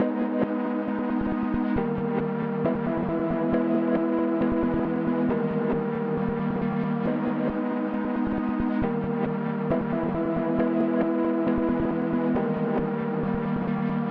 Melody Loop G min 136BPM; Sauce Gross

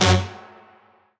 Synth Shot F

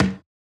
Bongo Perc

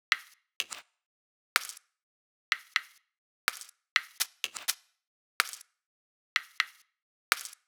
125 Core Percs

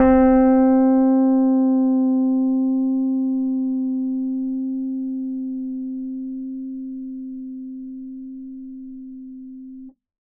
EPiano Wurli

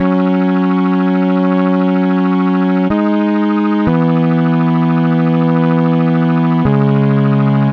Organ

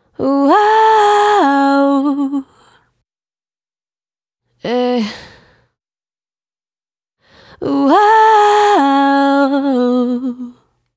vocal ooo whoa whoa whoa 130 E maj

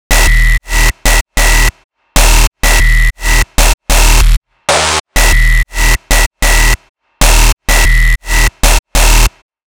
Bass Gm Full

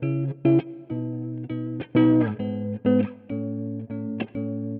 chill 100bpm Emaj

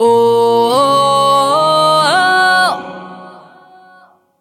Ebm Oh Wuad Vocal

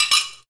Glass Rustle

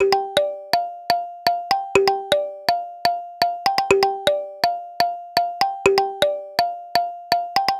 Synth, 123bpm, F, Kalimba

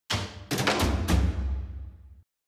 Rolls Bm 106 bpm

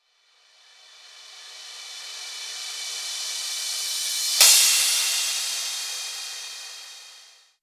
Cymbal, 138

